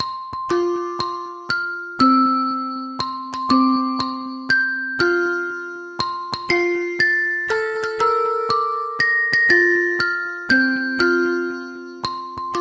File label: Toy Piano Happy Birthday